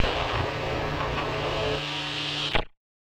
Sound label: Casio, Glitched, malfunctioning synth